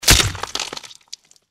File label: mantis death; npc